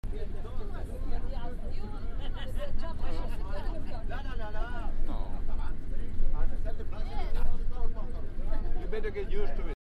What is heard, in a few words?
loop